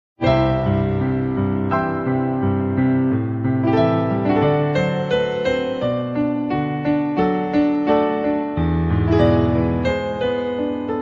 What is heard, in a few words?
love piano ringtone; Instrumental